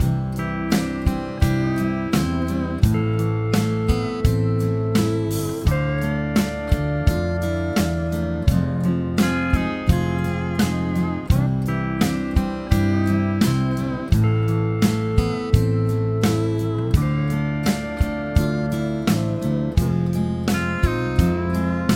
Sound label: chord prog with mixture